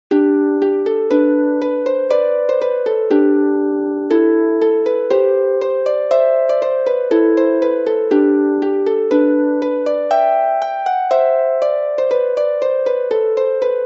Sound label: Begwns w chords, Welsh Style Tunes